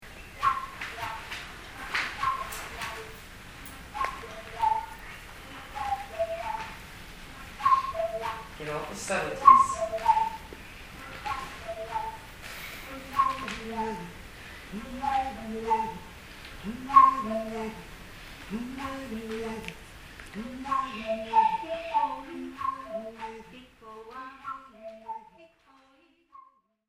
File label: whistle tubes